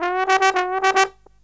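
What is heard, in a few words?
trombone, Killer Brass Riffs; USB Soundscan vol.29 - Killer Brass Riffs; AKAI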